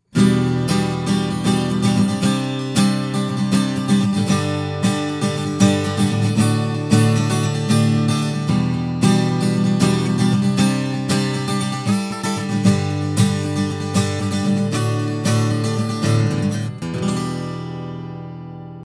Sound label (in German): Gitarre AKG C214 plus Air